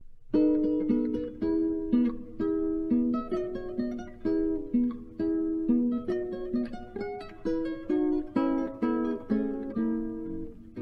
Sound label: Minuetto in E Major